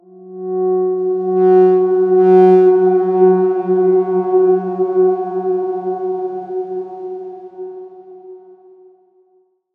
Darkswarm F#3 f; healing soundscapes; Ableton

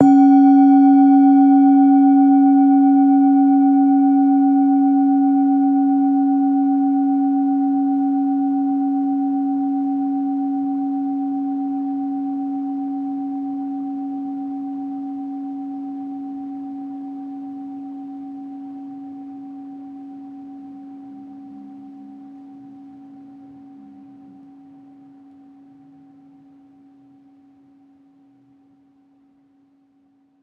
bowl medium C#3 mf; healing soundscapes

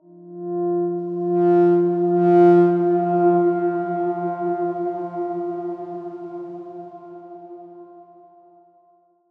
Darkswarm F1 f; HSS Ableton Pack1

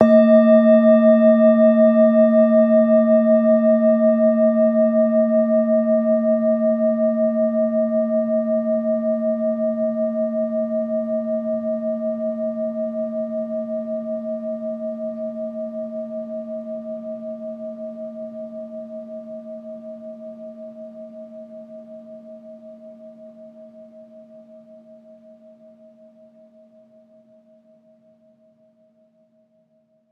bowl 2 medium A2 mf; healing soundscapes

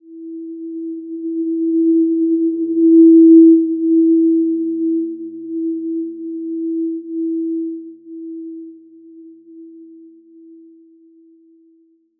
Simple Glow E4 p; healing soundscapes

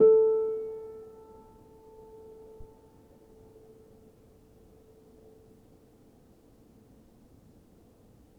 Player, Upright Piano; healing soundscapes; HSS OP Pack

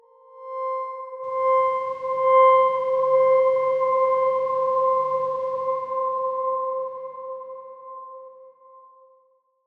Darkswarm C5 f; HSS Ableton Pack1

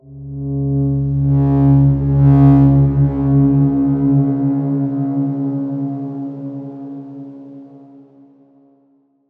Darkswarm C#2 f; healing soundscapes; Ableton

